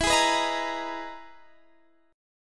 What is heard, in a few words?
E-Major Sharp 11th E